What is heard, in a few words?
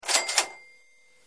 money cash register; Delicious Emilys Childhood Memories